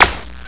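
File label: ricochet, weapons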